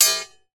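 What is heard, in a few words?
warfare sword hit another fight; zapsplat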